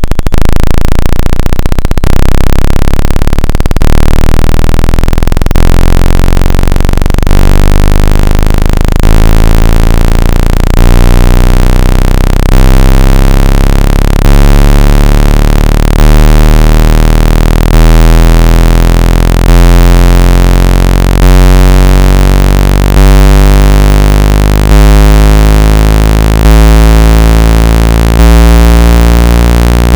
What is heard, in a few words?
square 2 saw